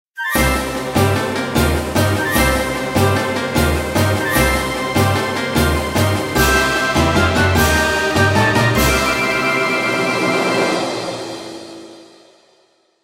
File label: orchestra jingle